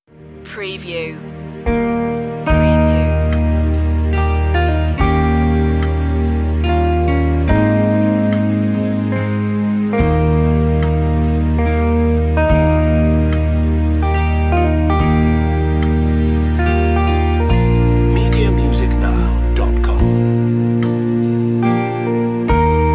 amazing grace regular verse 72bpm

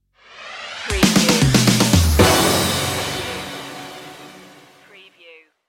boots of fire stinger

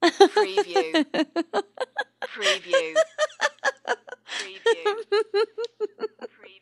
Woman Cracks Up

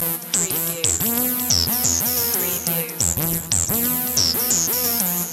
Hip Hop Synth Licks 90 BPM - E - Straight

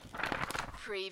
paper fanning book

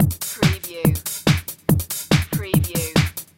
Techno-Trance Drum Loop 142 BPM

